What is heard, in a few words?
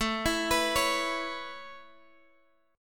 A Major Add 9th A Guitar Drop D, chord